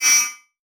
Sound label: Multimedia Internet CD-Rom Flash Short